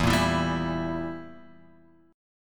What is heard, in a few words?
E Diminished E; Edim Chord {0 1 2 0 X 0} strummed